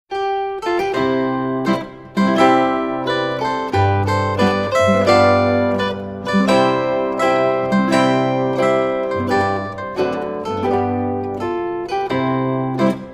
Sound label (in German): Marsche